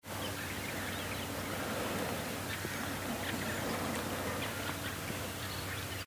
starlings flying overhead

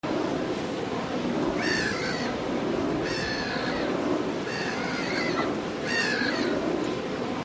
windmill gull, lowestoft

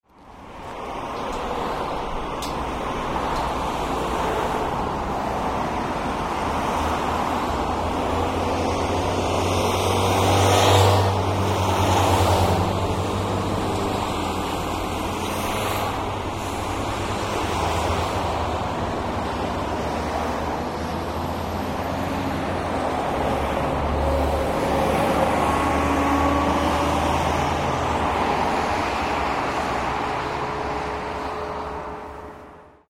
traffic

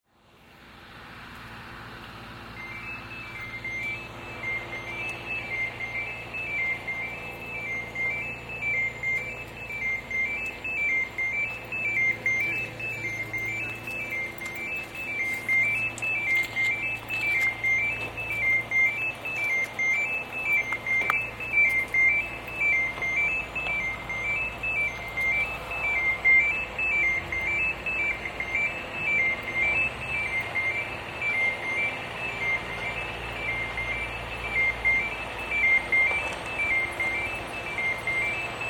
road surfacing

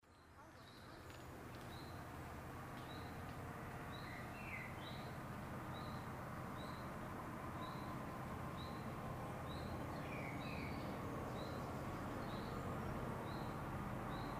ipswich train

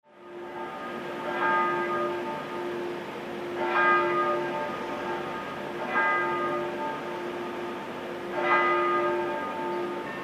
amsterdam bells

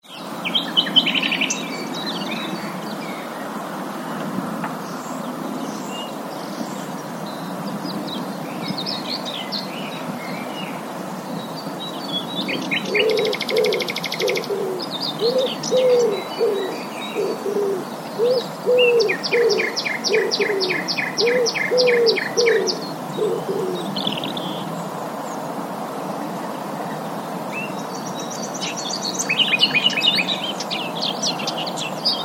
nightingale

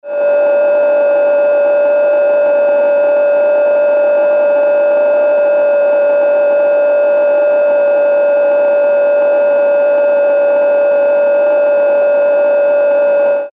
pll hash

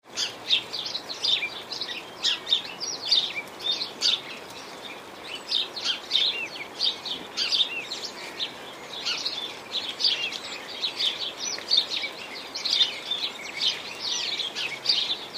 sparrows chirping in rain

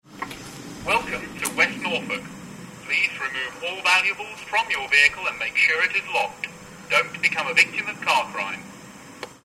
car crime, titchwell

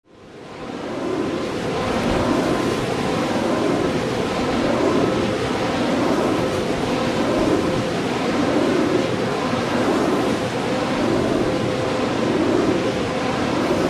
lowestoft windmill

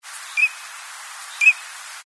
titchwell teal

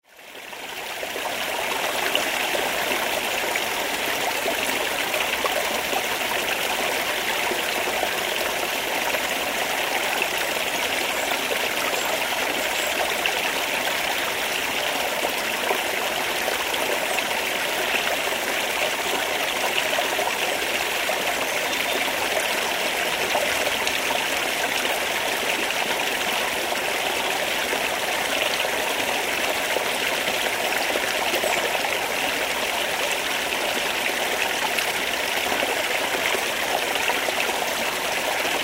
dartmoor stream; okmII

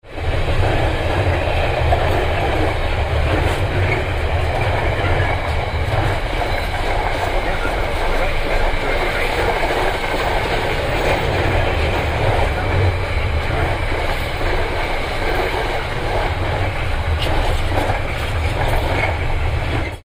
train

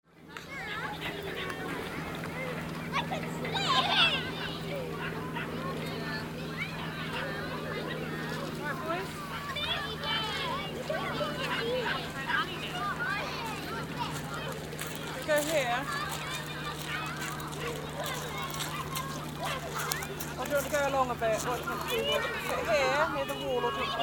bawdsey binaural

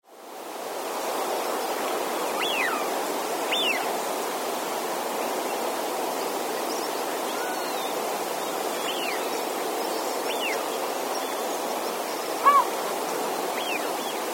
lapwing wigeon trimley marshes

